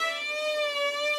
violin

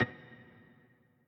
piano riff intro

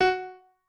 piano

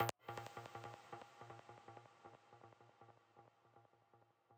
arp

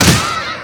Snare; 赛马娘

